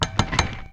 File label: Blacksmith Hammer